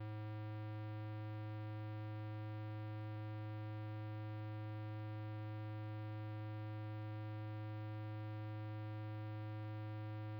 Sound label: engine; asteroids